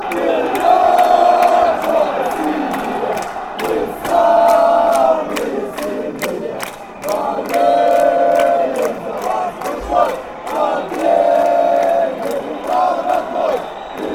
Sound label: chant, fanchants; metalist